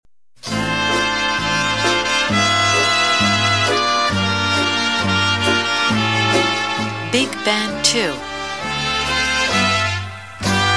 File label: bigband